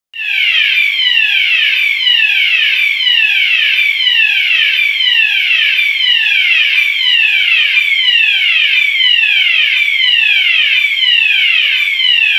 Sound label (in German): Feueralarm